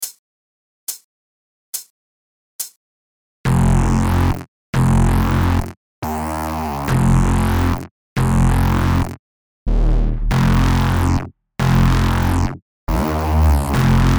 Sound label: dub 140